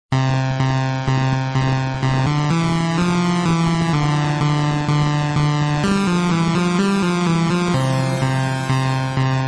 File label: Pulsar Tones